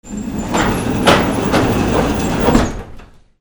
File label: DOORS